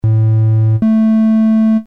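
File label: triangle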